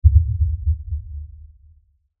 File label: Impact Lfe Rumble; Blastwave